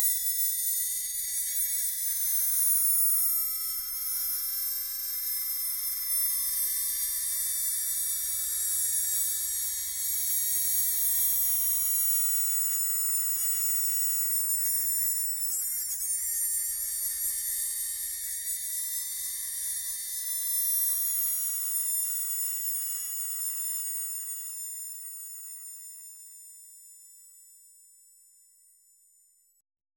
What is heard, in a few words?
sparklers; special effects